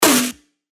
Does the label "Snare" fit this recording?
Yes